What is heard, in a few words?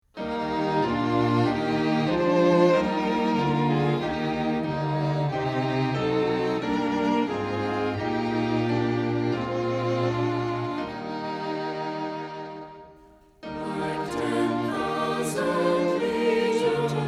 hymn vocal and instrumental